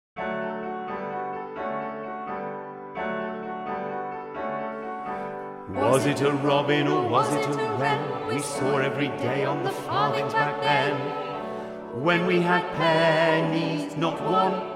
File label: coins (bass)